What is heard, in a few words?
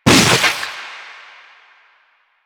Runner Arm Attack Hit; Enemies; Warframe; Proto Infested